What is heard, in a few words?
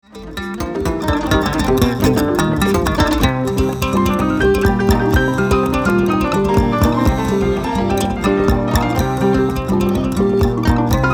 Griot Jazz